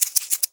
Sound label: MULTI EGGS; Best Service; World Colours